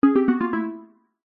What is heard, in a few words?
game sound soft dull tone lose fail